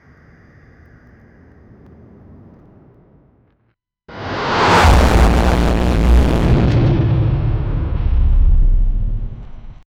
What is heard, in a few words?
impact every mutant step; apocalyptic